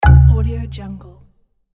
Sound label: error notification